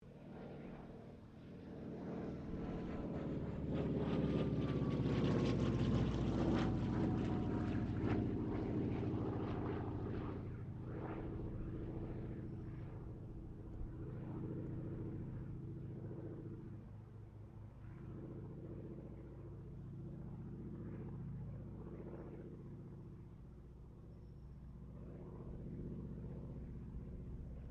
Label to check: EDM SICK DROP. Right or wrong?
Wrong